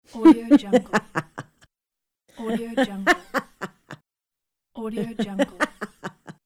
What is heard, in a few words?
female laughing